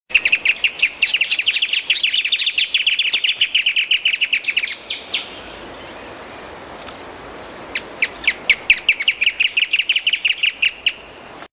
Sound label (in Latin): Furnarius figulus; Ilha Coman